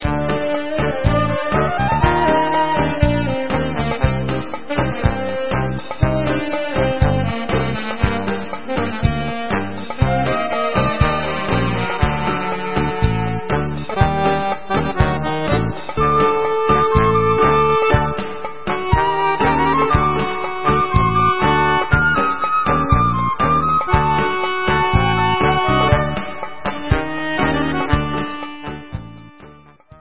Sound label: Instrumental